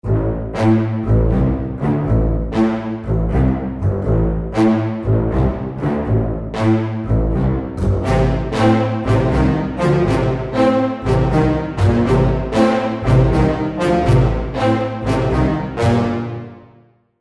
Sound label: action riff